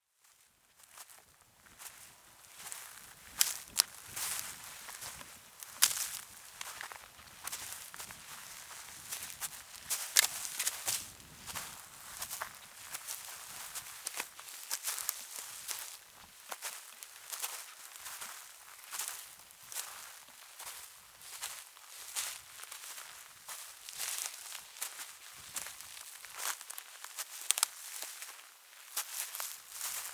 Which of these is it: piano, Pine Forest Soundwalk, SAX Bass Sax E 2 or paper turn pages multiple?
Pine Forest Soundwalk